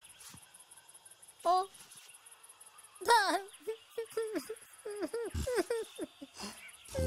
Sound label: elephant; animanimals